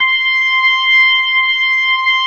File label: C6, B3